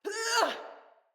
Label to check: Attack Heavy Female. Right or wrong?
Right